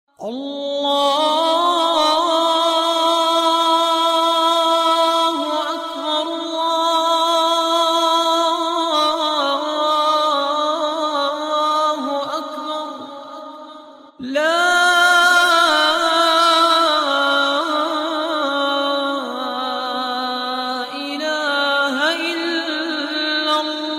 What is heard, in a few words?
islamic azan ringtone